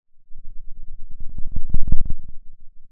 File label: Sound of a thunderstorm, center